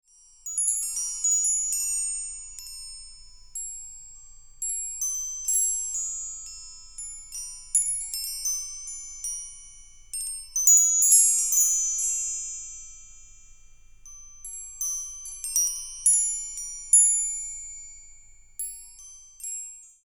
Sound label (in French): carillon petit meditation cristal noir